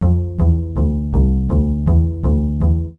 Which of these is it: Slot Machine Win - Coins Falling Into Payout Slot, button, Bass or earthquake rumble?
Bass